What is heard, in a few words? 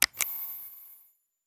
Flashlight On